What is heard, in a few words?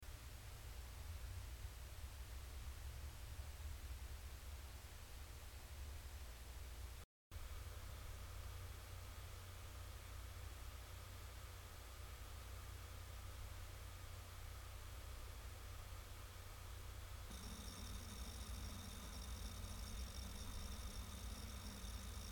samsung eco 500gb 1m 16dba